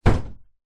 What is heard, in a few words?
household door internal wooden modern close other side bedroom room environment